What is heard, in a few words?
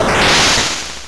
fireball; guardian legend; area9